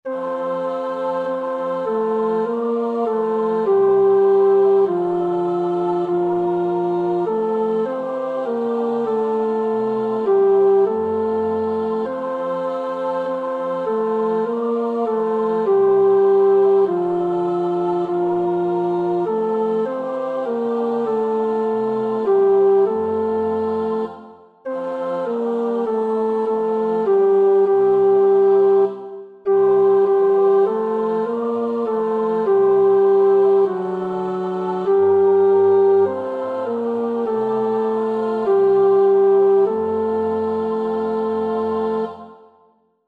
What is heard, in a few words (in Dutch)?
Es ist ein Ros entsprungen (tenor), Oefenbestanden; Praetorius (Michael)